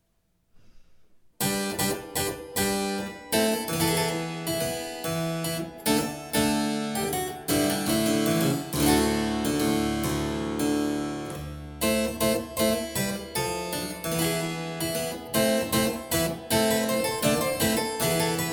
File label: CLAVICEMBALO; Aria Signora Cinthia; Pavana in passo e mezzo